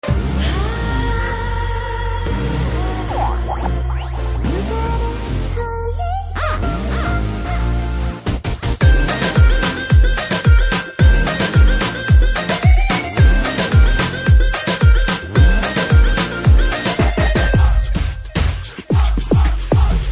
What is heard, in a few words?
Date Night BGM; Rowdy Boys